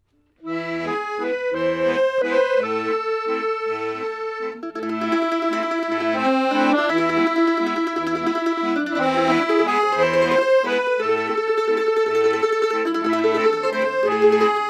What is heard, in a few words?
Finnish Family Waltz